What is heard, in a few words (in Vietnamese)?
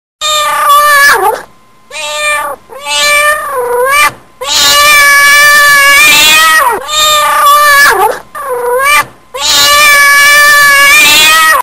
tiếng kêu con mèo; tiếng kêu của các loài động vật trong gia đình; hinh anh con meo